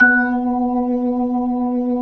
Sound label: B-3 Jazz, keyboards